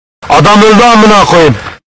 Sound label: bass